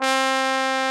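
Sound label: brass, Solo T.Bone; Roland L-CD702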